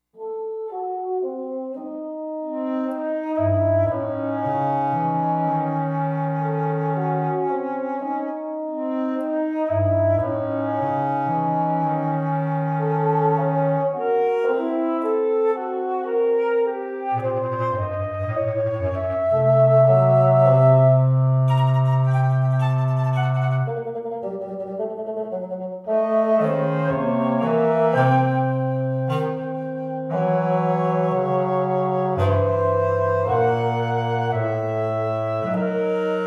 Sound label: Wind Trio